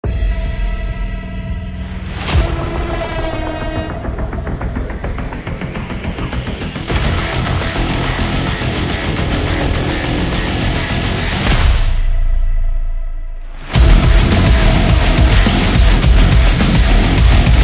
Epic FPS Doom Metal Trailer

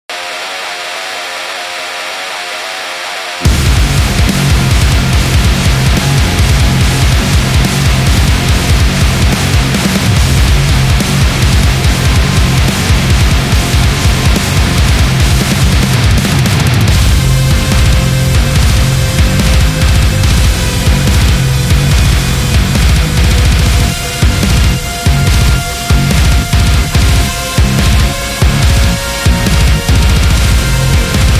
A Metal; gym rock

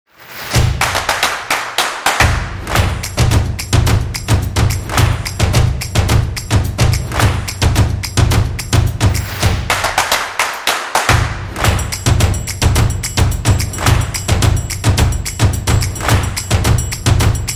Clapping Percussion

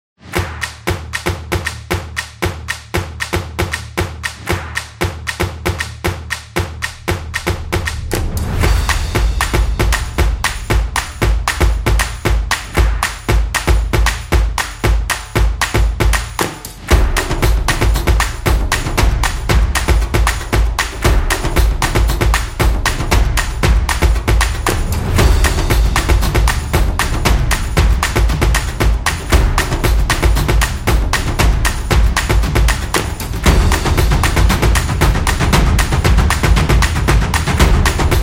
Drums of Sport; drums trailer

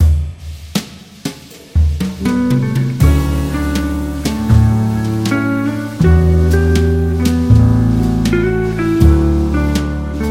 Relaxing Jazz

